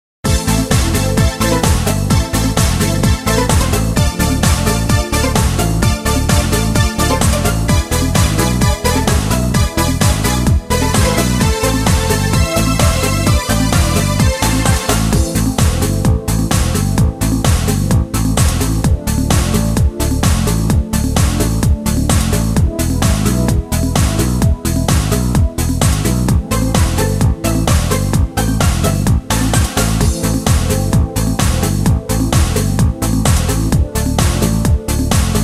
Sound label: brass minus